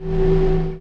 clock stand

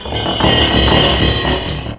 chain

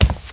golem step

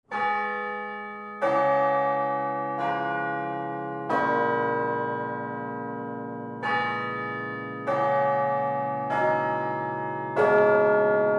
prtch bell

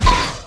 spirit wind attack

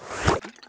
die; ashring

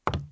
cruiser move

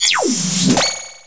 harpy die

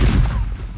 evil cloud hermit attack

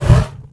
fire arrow

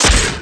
angel iceslug damage

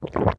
tengu stand

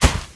bigfoot step

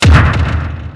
tiger cannon

